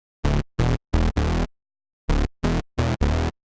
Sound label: bass, edm samples